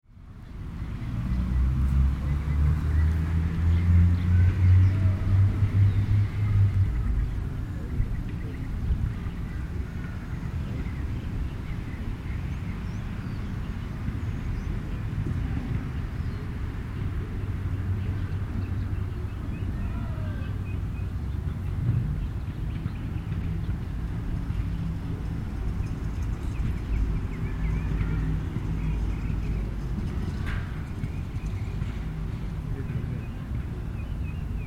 City Neighborhood Daytime, General residential ambience; Distant traffic, kids and men talking and laughing in background; City Sound Effects